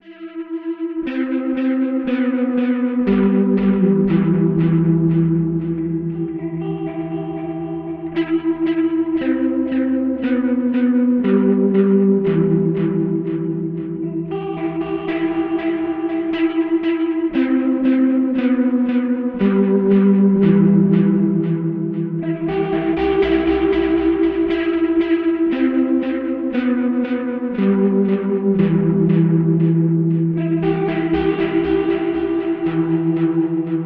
Rotary Vintage Swell; Line 6 HX Effects

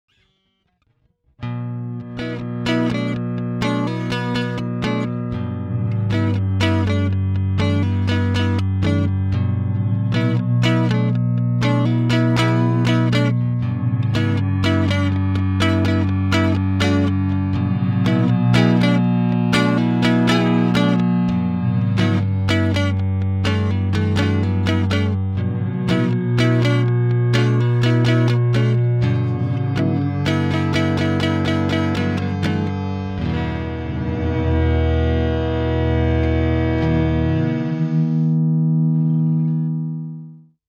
Superego Momentary Mode Gliss

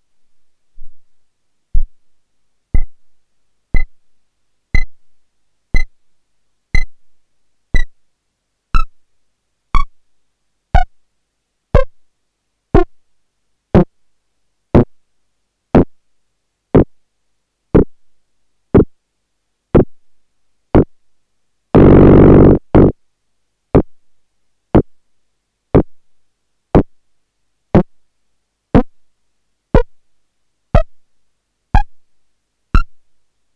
Erica Synths Perkons HD 01 Voice 2 Complex Drum

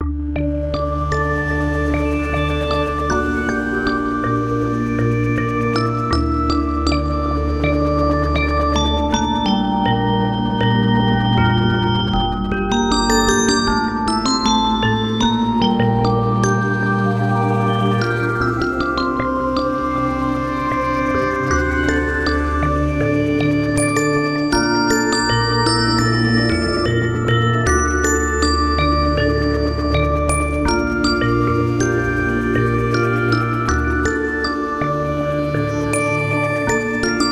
Delirium Bell

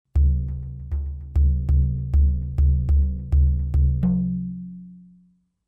Synth Drum Framedrum; Mooer

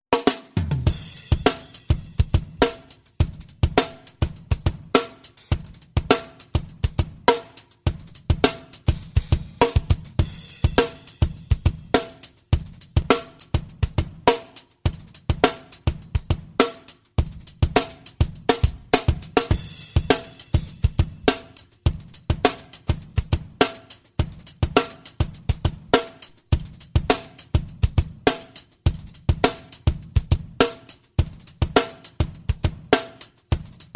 spl passeq Drums PassEq

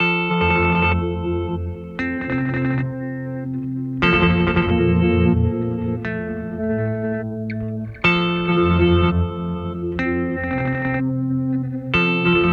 Cooper FX Outward V2

